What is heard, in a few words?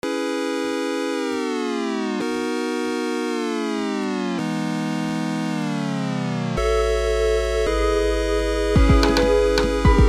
piano mash